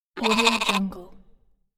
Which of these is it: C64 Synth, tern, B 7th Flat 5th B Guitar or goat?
goat